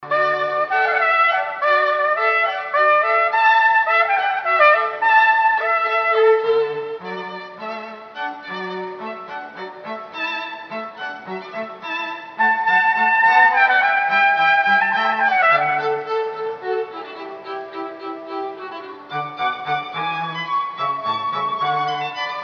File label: Trumpet & Strings Hornpipe